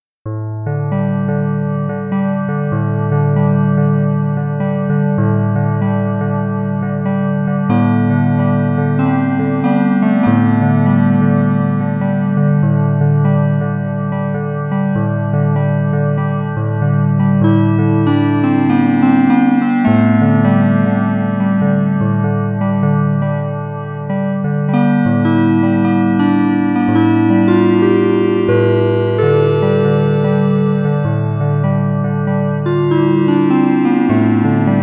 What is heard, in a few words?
26edo improv